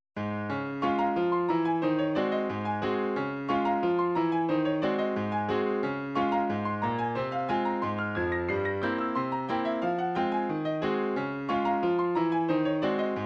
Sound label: piano